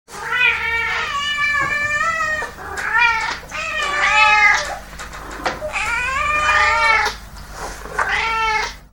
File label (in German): konzert